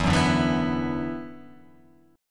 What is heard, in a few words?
E 7th Suspended 2nd E down, guitar